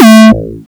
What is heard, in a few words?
tom